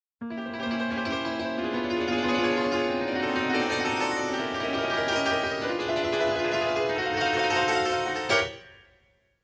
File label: Comic Tension; Ren & Stimpy